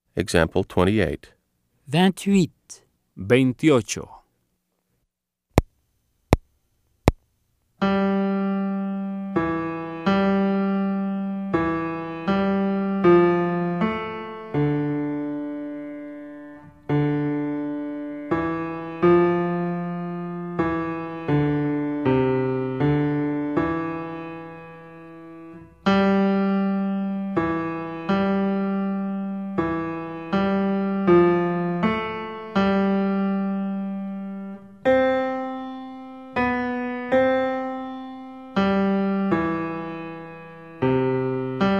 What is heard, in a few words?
Keyboard